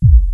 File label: MG1 KIT, MOOG; OLD SYNTHS; Rubber Chicken Software Sample Bank